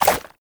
Baby Jump; Warframe; Nokko Colony; Visions

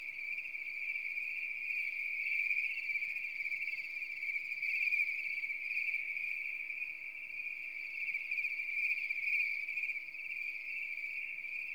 Night Insects Loop

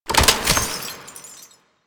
Belt Fed Rifle Reload; Weapons; Warframe; Tenno; Frumentarius

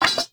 Torch Puzzle Torch Place Drop; Puzzles

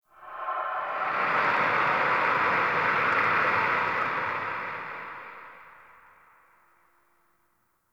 Corrupted Ode Wind Gust Toxic; Ambience; One Offs

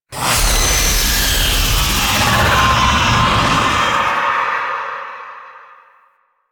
Medusa Gaze Cast; Warframe; The New War; Archon; Nira; Abilities

